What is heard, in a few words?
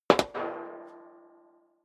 step high heels metal rattle single right; schritte